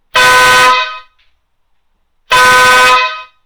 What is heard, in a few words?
Banshee horn